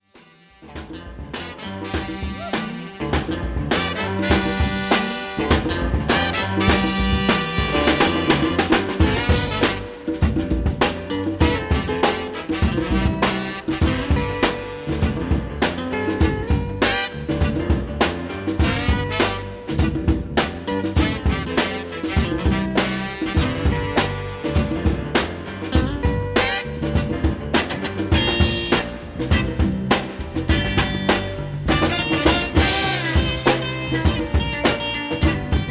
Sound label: soul drums stop